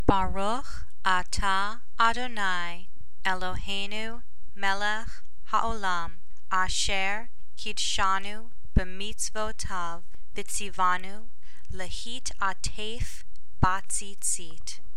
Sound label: Tallit blessing